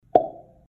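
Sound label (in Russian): звук чпок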